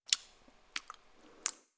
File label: Eating; Babushka